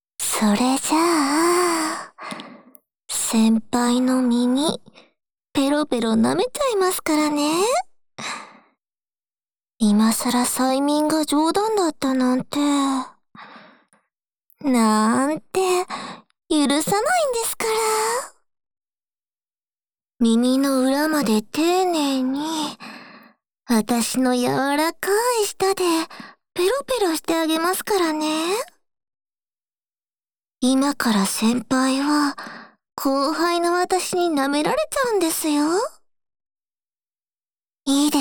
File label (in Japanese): 耳舐めとオトナのキス; 後輩ちゃん